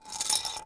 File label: metal slide Concr sm; objects; Star Wars Obi-Wan; XBOX